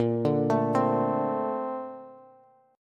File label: A sharp Major 9th, Bass; arpeggiated